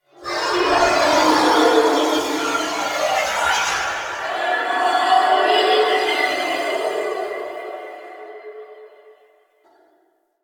Tower Whisper; Warframe; Quests; TheNewWar; Ambience; Cetus; Unum Tower

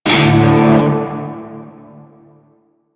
Shawzin Chromatic Chord C; Music; Warframe; Narmer Shawzin

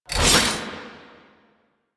Whoosh, Warfan

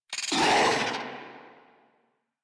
Infested Charger Alert, Enemies; Warframe

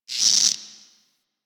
Insect, Alchemy Lab; Liset; Warframe; Hub

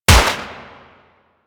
Uzi Shot; Warframe; Tenno

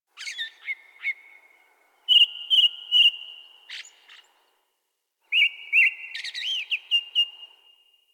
Tree Critters, Ambience Plains; Warframe; Eidolon Plains; One Offs

